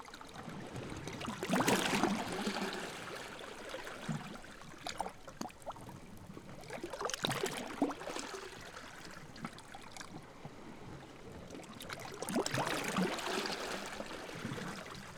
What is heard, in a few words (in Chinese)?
汩汩声吹走吹散; 海浪聲; 自然音療